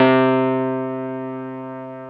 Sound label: Keyboards Old School, C2; USB Soundscan vol.09; AKAI